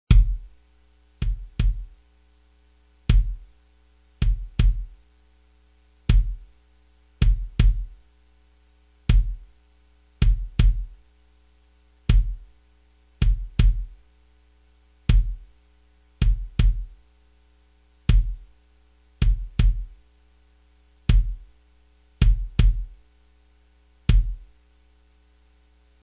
drumset bass drum

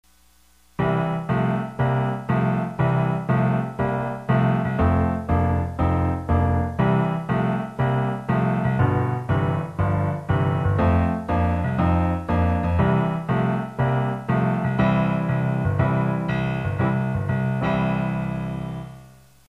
i iv and v blues

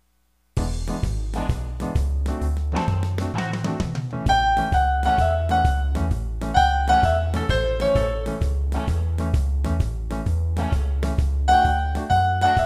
blues tutti

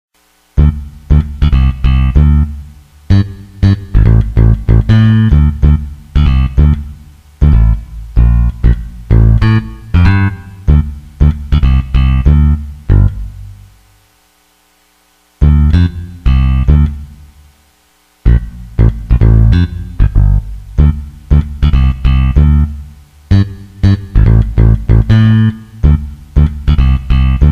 smjazz bass

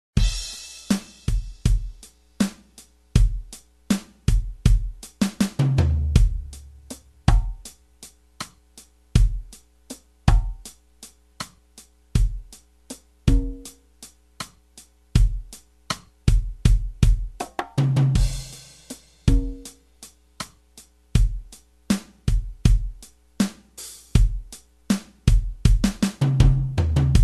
drumset varied patterns

